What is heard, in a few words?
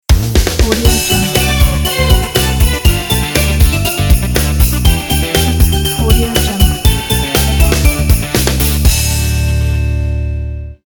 christmas logo